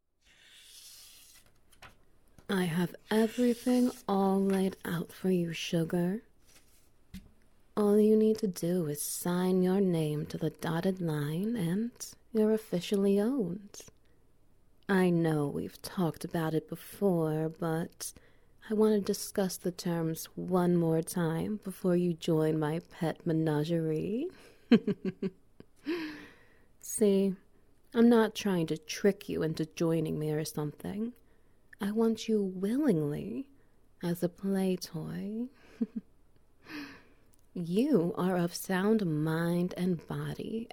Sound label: SFX Wet Noises; Diath the Demoness